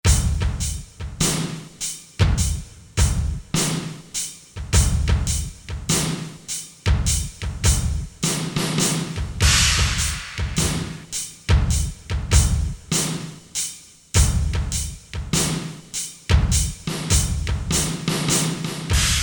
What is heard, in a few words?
Drum Kits; Korg DSS-1